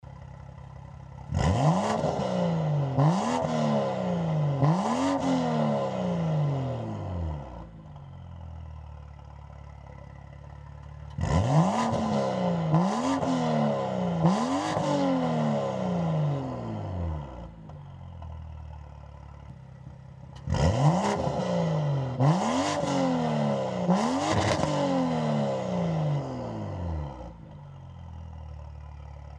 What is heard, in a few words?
BMW F87 M2, exhaust